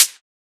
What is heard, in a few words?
Closed Hat; FLPRO